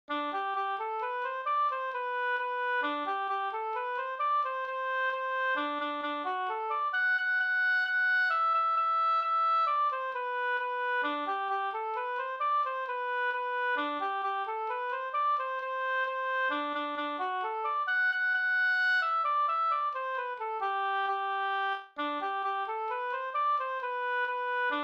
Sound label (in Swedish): mazurka; Brors mazurka e Bror Andersson Flen